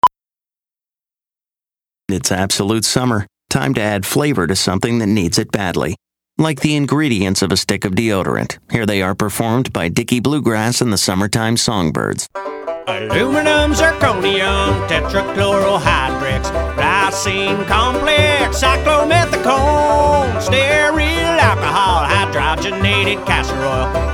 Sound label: Absolute Bluegrass